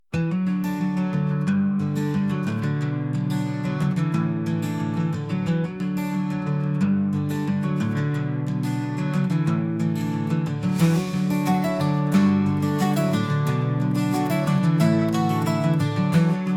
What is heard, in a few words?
Acoustic Punk, instrumental punk